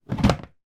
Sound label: storage box plastic small lid open; foley; zapsplat